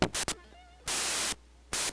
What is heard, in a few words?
Noise